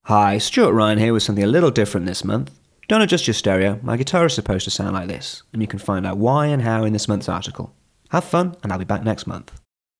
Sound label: Acoustic intro, Guitar; Guitar Techniques 2019 Issue 296 June